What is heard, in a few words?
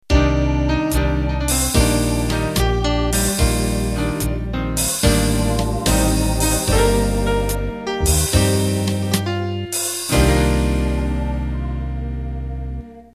Slow Jazz End